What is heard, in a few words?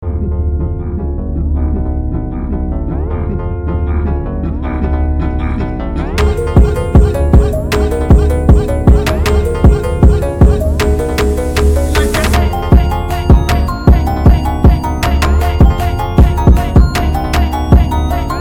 Brazilianfunk phonk Speed Up